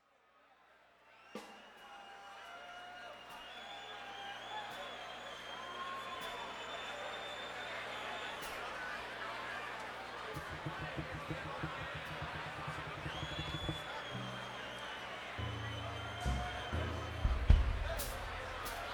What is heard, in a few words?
Crowd